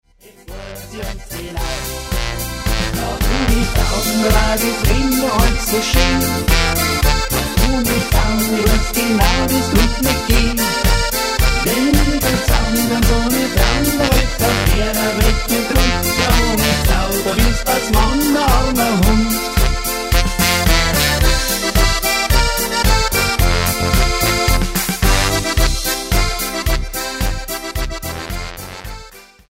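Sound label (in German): Playback Chor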